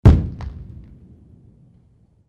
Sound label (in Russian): salut; noviygod